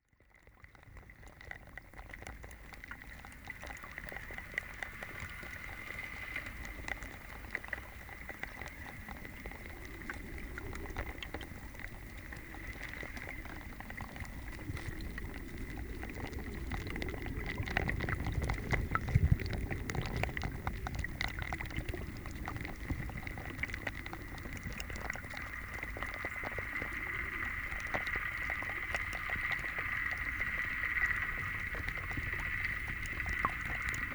old pier 48 ice water wind, hydrophone in the river ice at old pier 48 hudson; imbolg